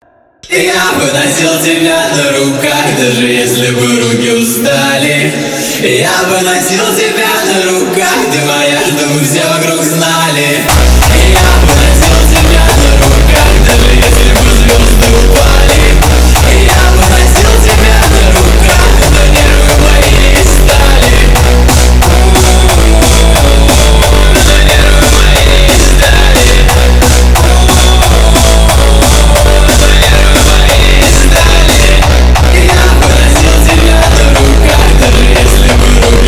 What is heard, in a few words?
hardstyle remix